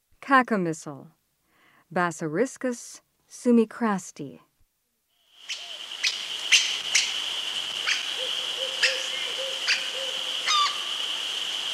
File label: Cacomistle; S. America